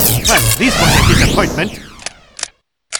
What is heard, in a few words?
lose life; freak fortress 2; sans